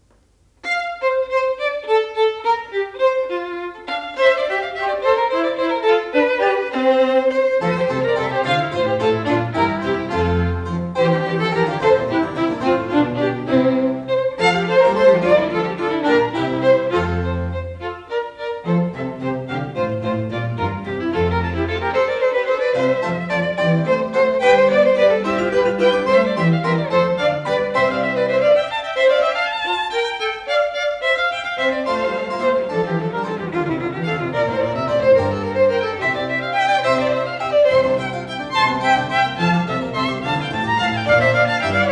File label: Trio Sonata No9 Golden Sonata; Purcell